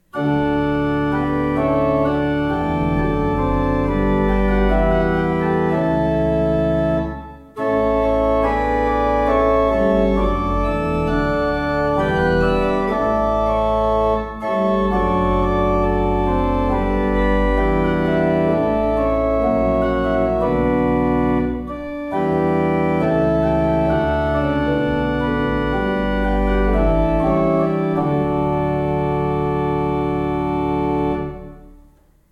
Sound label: Schuke Berlin, pipe organs